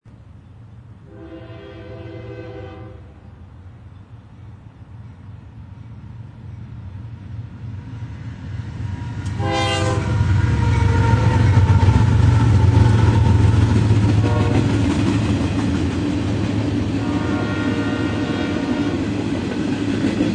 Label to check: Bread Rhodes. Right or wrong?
Wrong